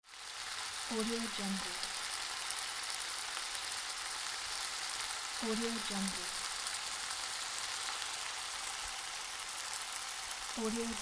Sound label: frying